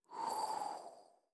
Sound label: Breeze Mouth Short